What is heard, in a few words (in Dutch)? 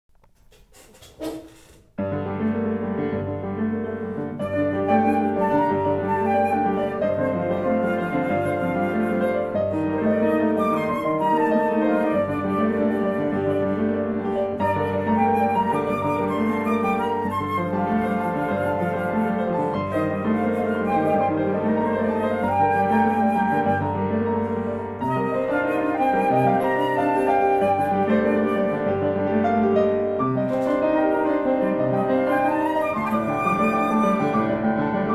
Riverbed, Fluit en piano